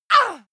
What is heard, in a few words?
female combat hit soft; schreie; menschen